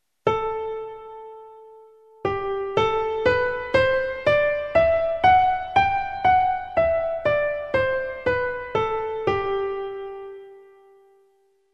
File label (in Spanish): escala